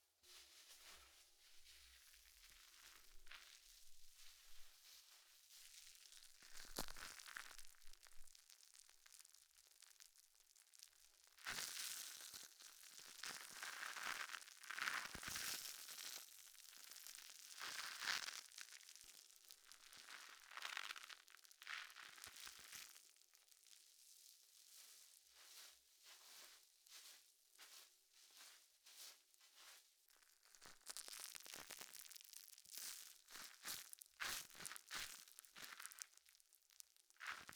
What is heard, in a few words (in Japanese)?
泡風呂の泡を耳元で; 3DIO; お風呂に関する音